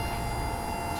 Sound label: plane; mcheli